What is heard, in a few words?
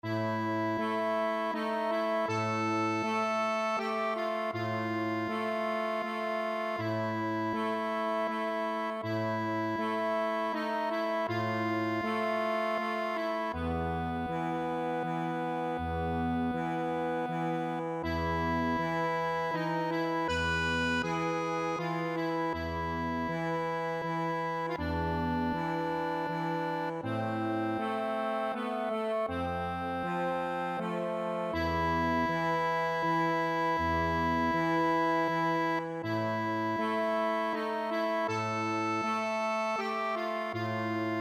accordion